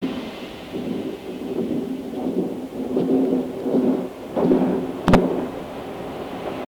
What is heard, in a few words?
Foot steps